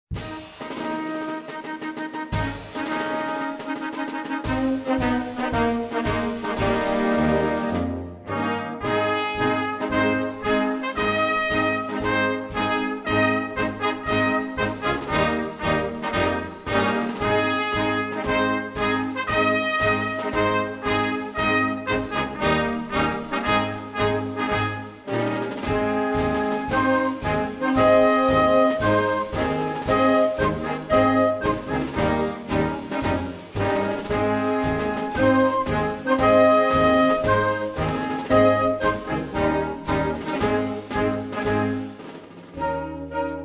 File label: Fanfare for Brass; solowerk